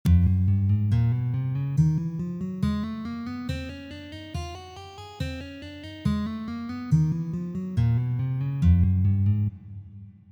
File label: Hammer On